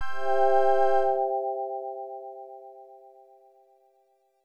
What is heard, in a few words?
Success!; Eye of the Beholder II: The Legend of Darkmoon; IBM PC AT